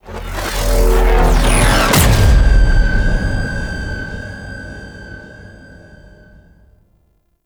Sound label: Sentient Fragment Warp Away; Squad Link